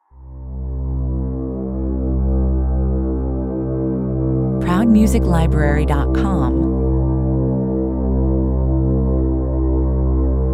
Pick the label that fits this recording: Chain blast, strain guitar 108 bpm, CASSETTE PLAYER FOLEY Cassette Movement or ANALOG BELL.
strain guitar 108 bpm